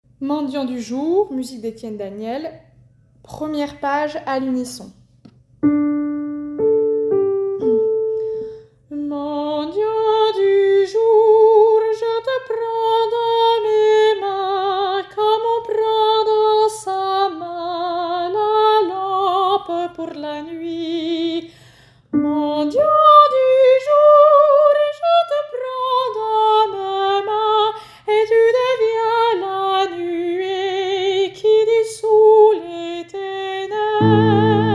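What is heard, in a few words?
unison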